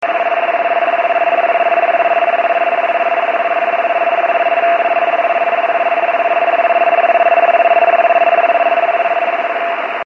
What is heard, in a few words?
alaska, radio beacon